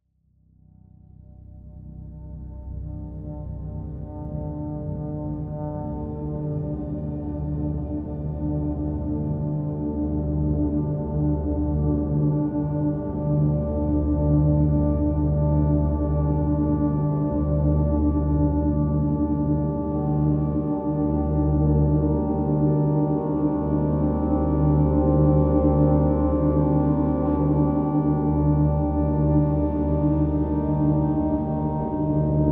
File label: epic drone